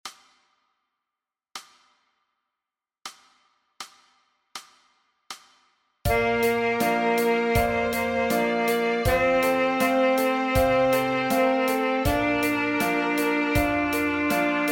G Scale

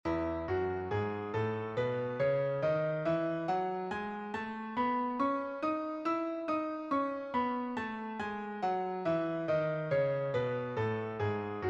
Scale E Two Octaves

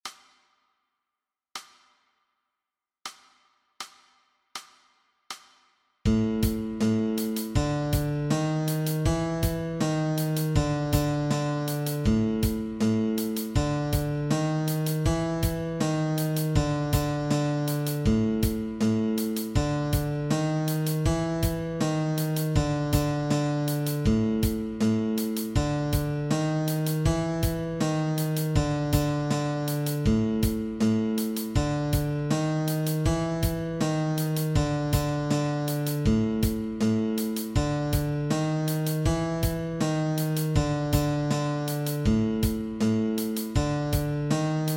Guitar Exercise

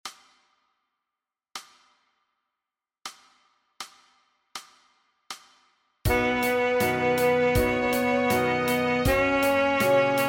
Ab Scale

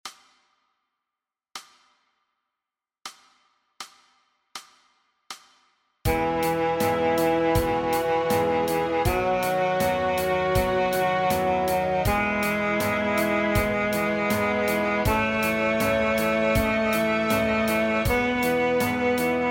Db Scale

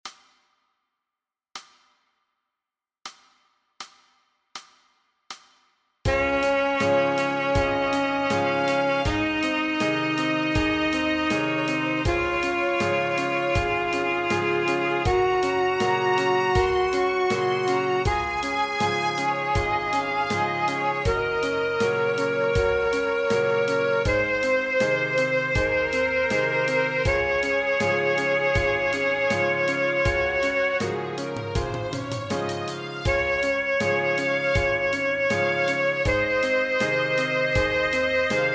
Bb Scale

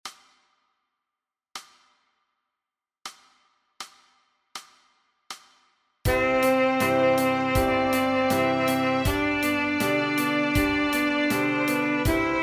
A Scale

